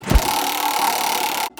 Ui Combat Wheel of fortune; Angry Birds Epic

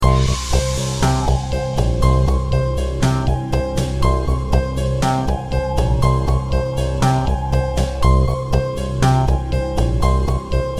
Military Theme; Harkbackhood; perimeter

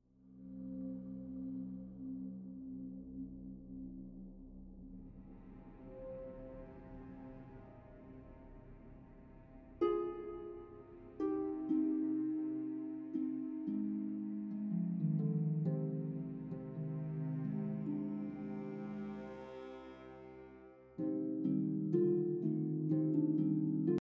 BGM Map Start; Edge of Hearts

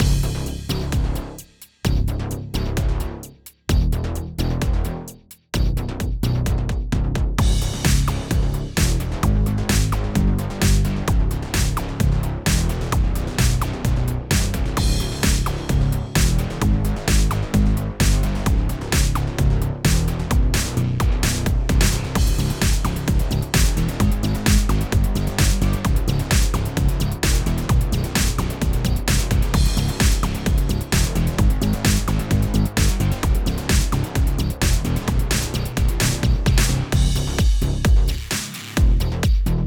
Doom Mix Stem Layer; Asteroids

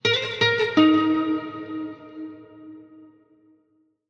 Stinger Guitar; World of Warcraft 9.1 Chains of Domination; Tazavesh Stinger Guitar 8

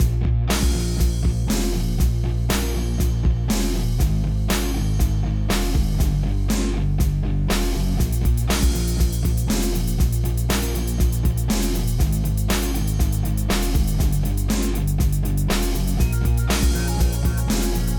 Desert Abyss Theory (Back-Up Loop)

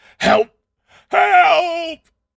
Extra Screams

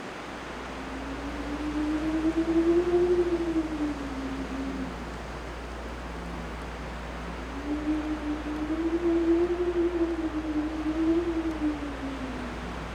Ambient Wind; Terra Feminarum

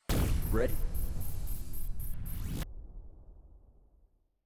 JINGLE Ready; Fallen Knight

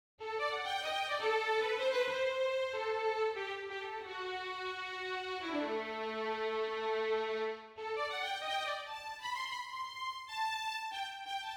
Desert Hub Melody 95bpm

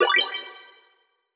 Game Achievement Bling; Zarya and the Cursed Skull

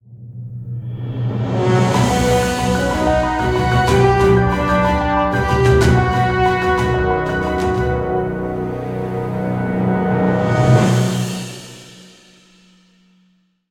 stinger victory; roguebook

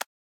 Catch

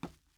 wood step; teardown; ps5 windows xbox series xs

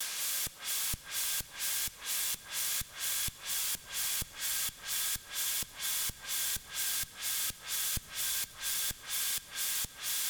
Sweeps; super crush k.o.

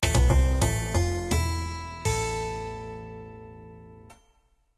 Beetle Collection (Success); I Spy Spooky Mansion; Wii

